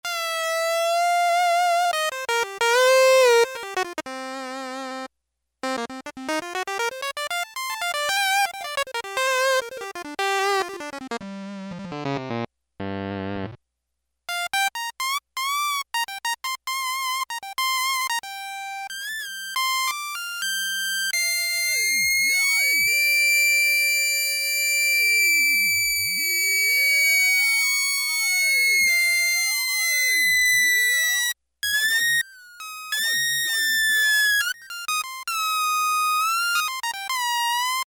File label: Synth Lead 1, Roland W-30; Factory Performance Patches